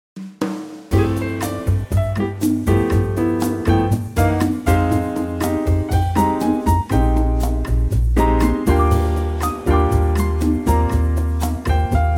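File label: A minor bossa acoustic trio 120 bpm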